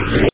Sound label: Chute; Hyper Stacks; Pacific HiTech; Science; Chemistry